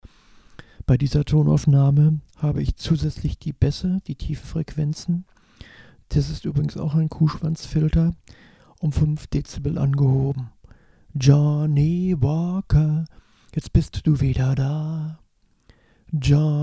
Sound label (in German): Akustik Duo; selbst abmischen